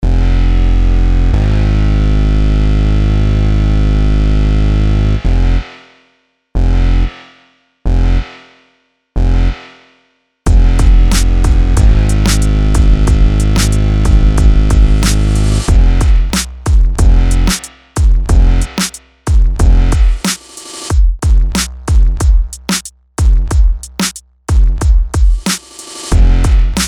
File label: Beat